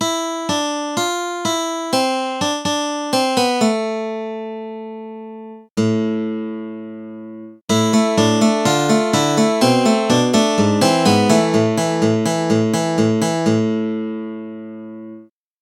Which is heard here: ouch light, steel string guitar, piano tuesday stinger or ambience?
steel string guitar